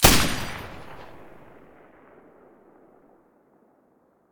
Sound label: silencer M249 stereo